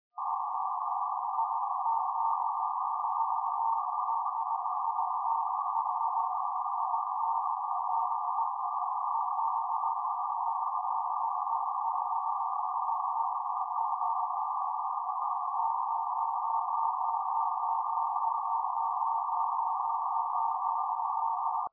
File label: BEACON, 2m; IZ3BVW